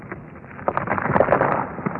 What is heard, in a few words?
fissure destroy; weapons; dota; earthshaker